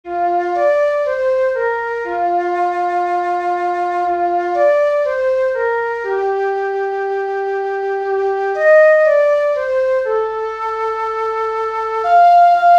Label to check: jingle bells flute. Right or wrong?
Right